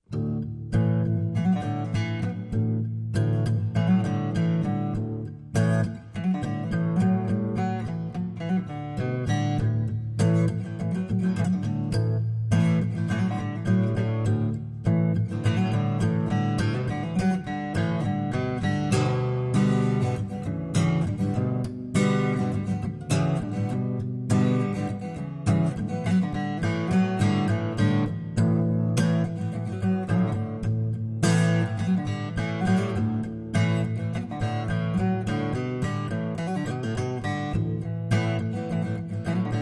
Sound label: strumming bird